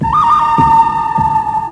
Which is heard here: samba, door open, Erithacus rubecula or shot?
samba